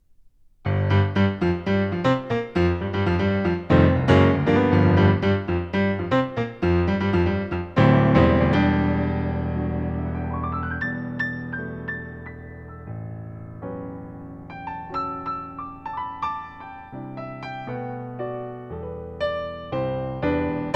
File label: yamaha p-255